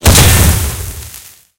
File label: tank hit heat small; tanks; War Thunder